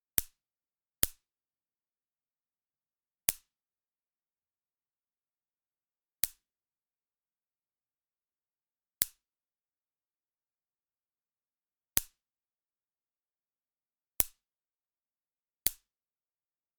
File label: Electric Mosquito Killer Bat